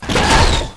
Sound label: common hit; cstrike; next21 kart